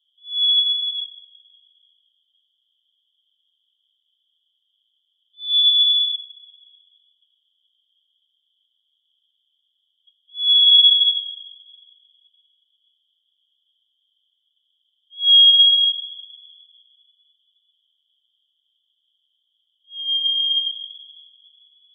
Bird Whistle Bird Full Sequence, Australian birds; Animals

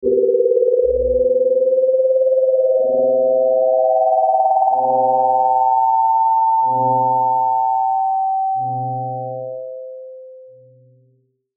Sweeper Feedback Long High Pitch; Production Element Imaging Element Accent Transition; Sweeper Sound Effects

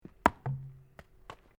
SPORT BALL RUBBER BOUNCE AGAINST WALL; Bounces; Objects

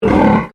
Animal Pig Landrace Inside Grunt

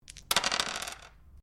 Dice Medium Pair Glass Table Throw; Variety of dice sounds; Casinos

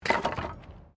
Wood Fall

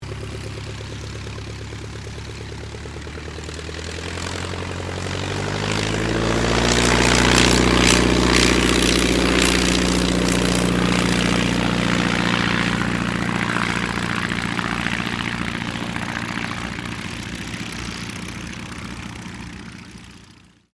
AIRCRAFT AUSTER III TAKEOFF; Airplanes; Vehicles

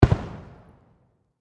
Firework Explode Distant; Firework Sound Effects; Fireworks (Sound Effects:Backgrounds); SFX Bible 6

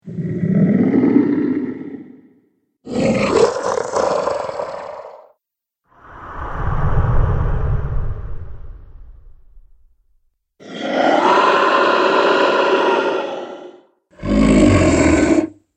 Big Alien Buggers - Collection; Alien Sound Effects

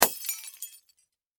Impact Glass Light Bulb Smash; Materials; SFX Bible

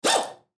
Air Ratchet Rev Single Pneumatic; Power Tools; INDUSTRY; Sound Effects:Tools; Blastwave

